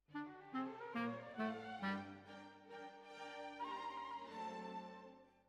Bassoon, Jazz for piano

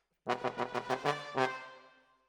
Trombones, jazz for piano

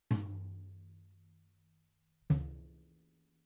BD Out

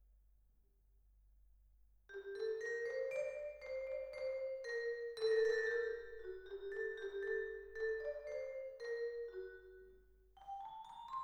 Vibra

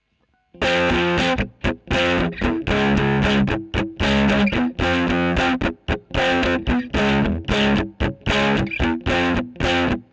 E.GTR Royer

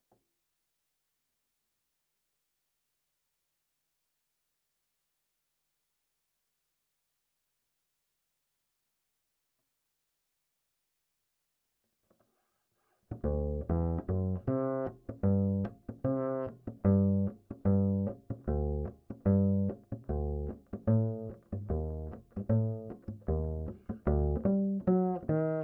Bass Line, Ragtime

